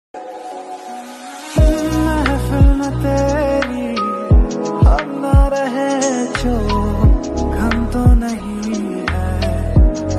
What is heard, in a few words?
ringtone lofi mix sad ringtone; nani beats